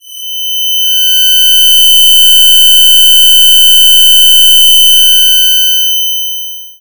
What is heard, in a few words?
Tinnitus